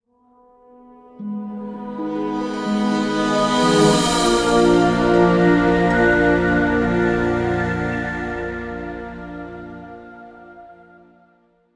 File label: Stinger Discovery; Dishonored